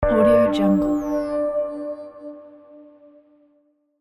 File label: steel bowl